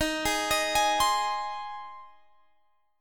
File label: C Minor Sharp 5th, D sharp, chord